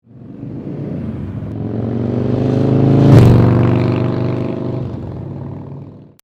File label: FLYBY